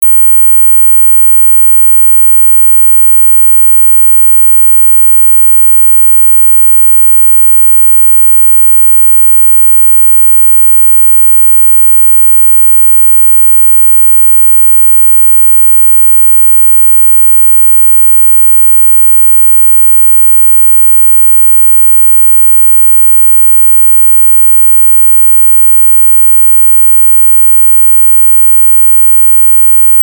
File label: mosquito